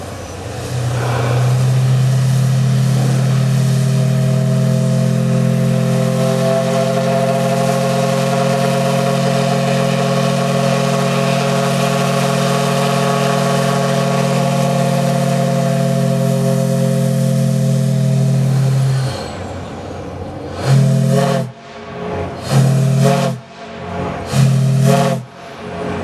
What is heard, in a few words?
whistle, steamboats; belle of louisville